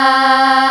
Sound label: DIGI VOX